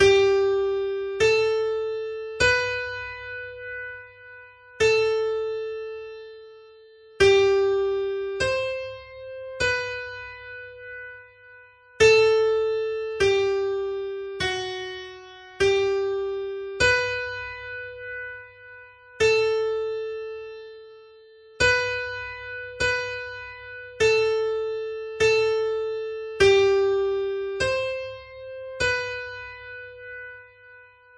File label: veryslow; Ring O Bells